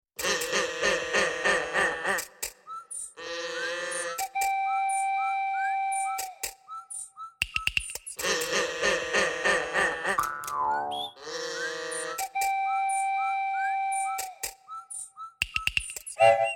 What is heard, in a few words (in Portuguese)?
jazz tropical caotico